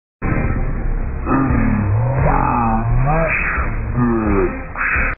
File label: whomp; vapesquared